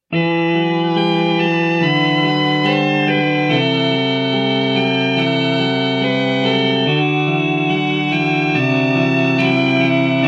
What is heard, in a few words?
Baroque